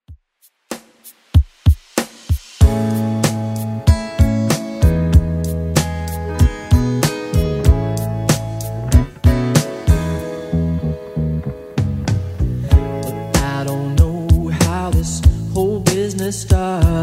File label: 70s redrum 95 bpm